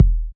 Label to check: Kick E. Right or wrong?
Right